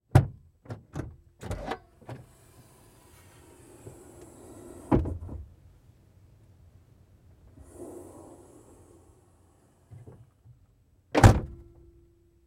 Expedition Rear Door, ford expedition

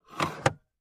ashtray

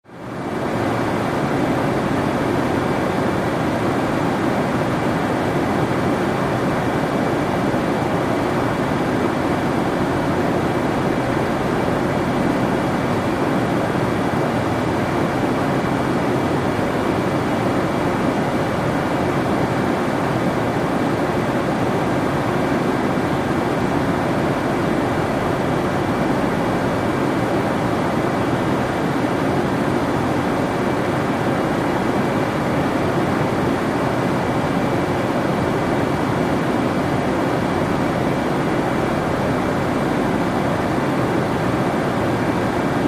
747 Jet Interior Coc

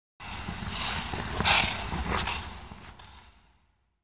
Ice Skating Skate By

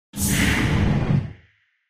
Space Door Close Sli, doors high tech

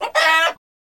Chicken Cluck Buck; chickens

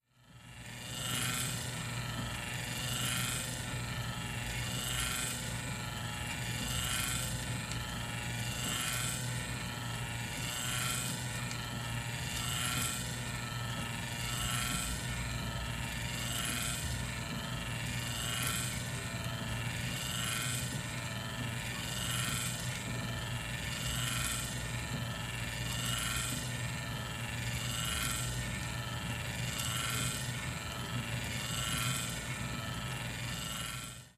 Small Electric Motor

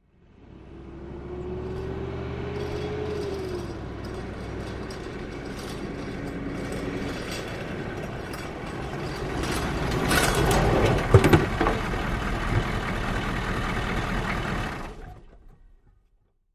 Humvee Med Idle Off

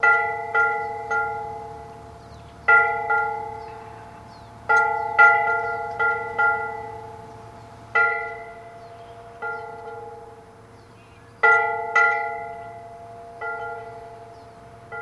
Bell Buoy Ringing

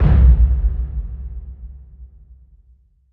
Bass Impact Low Drum; impacts

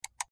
Highpitched Mouse, mouse

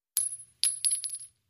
Shell Case Sngle Tube, shell casing movement

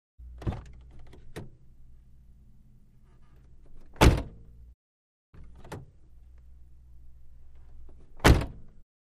Car Door OC Med, chevy corsica; chevy corsica 1987

